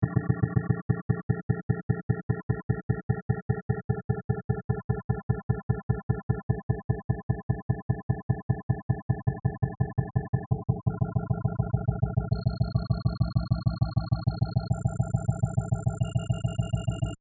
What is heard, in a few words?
staccato prime soup